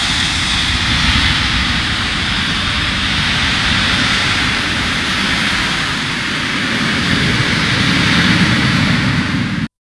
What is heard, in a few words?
fire; Звук пламени